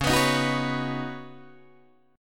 C sharp 9th Suspended 4th, C sharp, down, Guitar, DADGAD